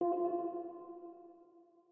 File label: organ